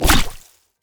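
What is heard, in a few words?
vase, enemy sound impact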